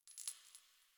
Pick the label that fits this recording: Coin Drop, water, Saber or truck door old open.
Coin Drop